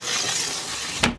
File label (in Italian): porta scorrevole